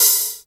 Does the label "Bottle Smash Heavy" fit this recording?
No